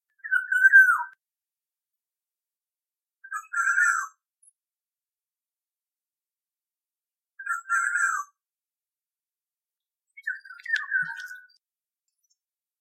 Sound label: oriole calls comparison